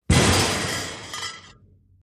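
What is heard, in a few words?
Heavy Metal Tank Smash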